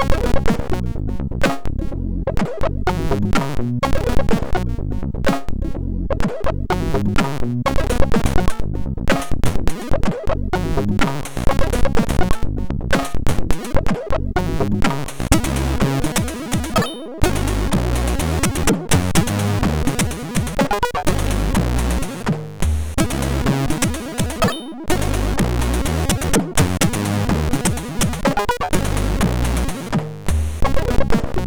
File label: note, MOS6581R4